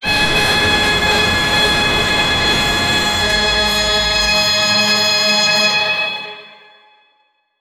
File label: big win jingle; 7 seconds; arcade